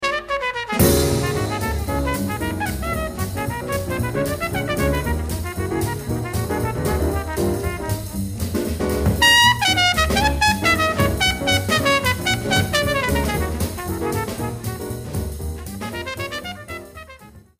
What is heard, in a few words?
solo